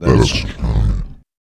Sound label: VOICE FX, Dance Vocals